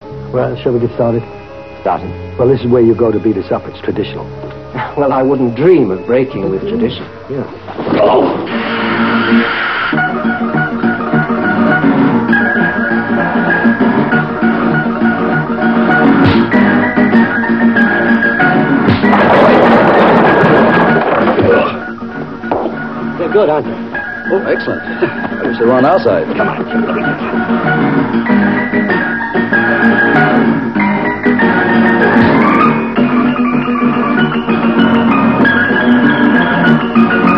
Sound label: piano